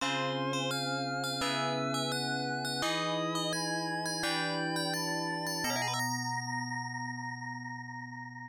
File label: High Score Table; Capcom no Quiz - Tonosama no Yabou; Sega; Megadrive-Genesis